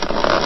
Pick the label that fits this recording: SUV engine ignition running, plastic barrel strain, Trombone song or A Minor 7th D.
plastic barrel strain